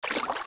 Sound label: Steps Deep Water Land; Zelda; OOT